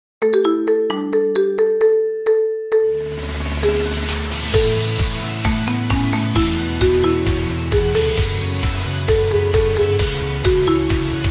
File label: Marimba Ringtone